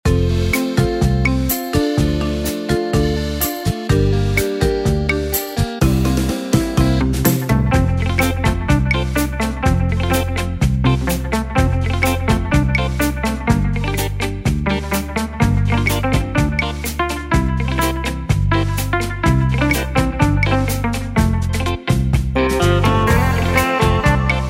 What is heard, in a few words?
60s Brush Rumba